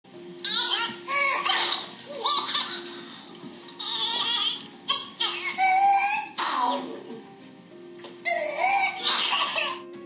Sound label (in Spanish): Tos lactante; Síndromes respiratorios